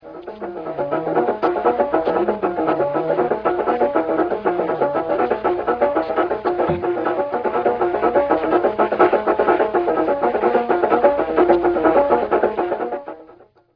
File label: melody